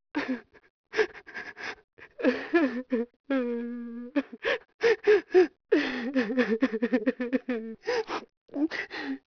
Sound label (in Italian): pianto; people